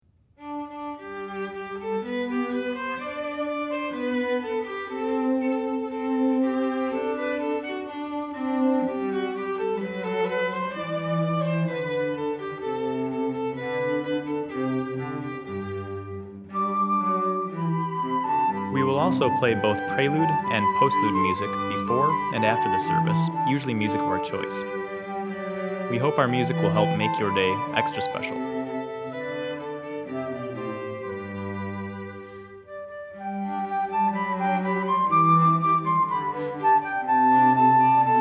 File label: Simple Gifts Shaker hymn